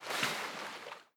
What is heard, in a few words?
Splash, Water; Barotrauma; Subsurface